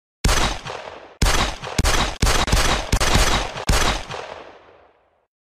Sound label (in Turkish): silah sesi tabanca sesi; icerde